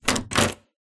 Rattling Door Handle; doors

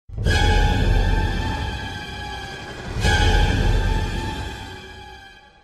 FEAR (SHOCK); F.E.A.R. Perseus Mandate

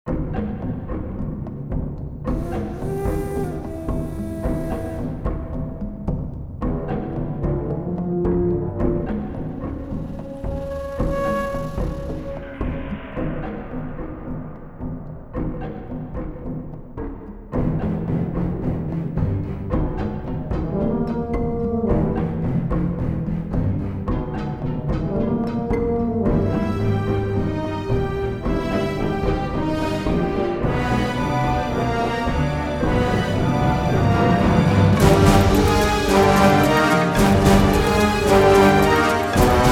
Underwater Tunnel

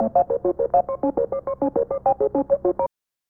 Shuffling Categories; Jeopardy; snes

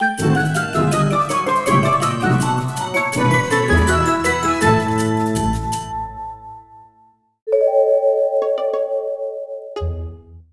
Minigame Monkey Lose

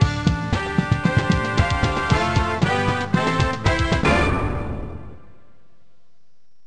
Over (Game Over); Data East's Hoops; Deco MLC System; arcade

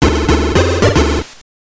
Death; Asterix Obelix; GB

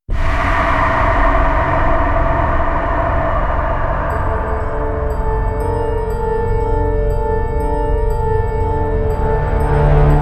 Side Stinger; Origins; Call of Duty Zombies; Treyarch 2008

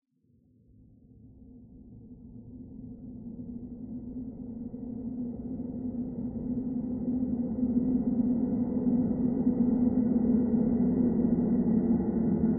Ambiance Undercave Main

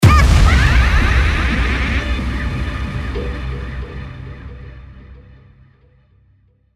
Death Jingle; Death Wish

